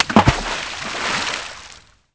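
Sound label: splash large; envrn; The Elder Scrolls III Morrowind GOTY; XBOX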